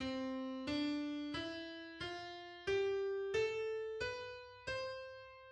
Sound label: Diatonic scale on C